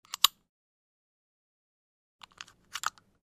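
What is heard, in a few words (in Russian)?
застёгивание расстёгивание ремней безопасности в самолёте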